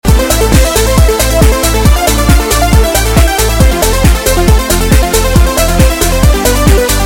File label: Loop